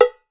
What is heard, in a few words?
SALSA, COWBELLS; Voice Crystal - Steve Reid Definitive Percussion